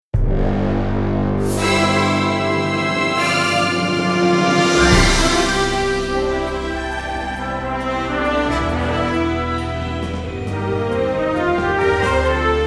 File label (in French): Orchestrale Broadway